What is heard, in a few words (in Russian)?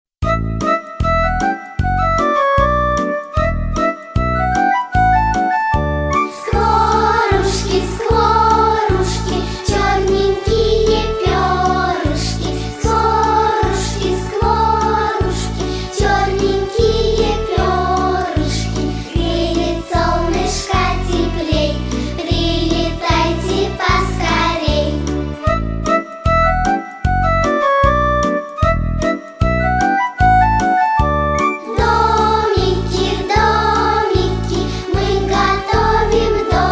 Skvorushki pesnya